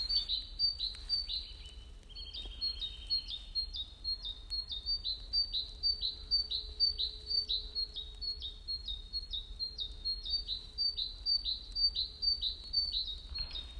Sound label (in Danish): Fugl Forår